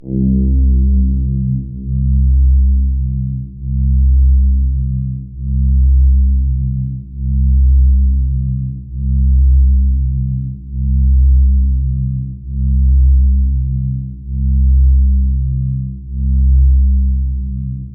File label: C2, Synth Line